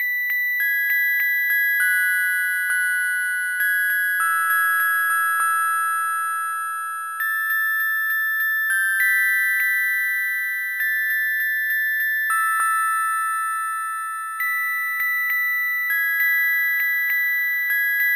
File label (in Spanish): metalofono